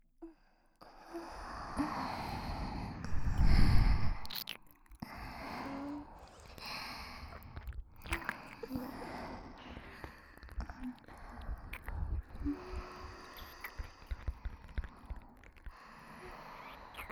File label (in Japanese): 甘い吐息や鼻息で甘い吐息ですやすや